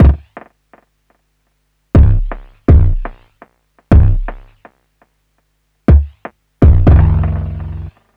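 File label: EAST bass drum, drum loop; Big Fish Audio; Uncivilised G'rooves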